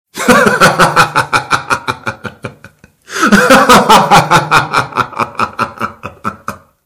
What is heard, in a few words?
man laughing